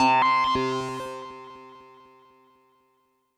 Synth C, Synth Prophet One Shots; Electronisounds

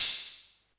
Roland U110 Elec Drums A#1

